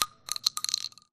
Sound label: 12 gauge shotgun shell drop; Titanfall 2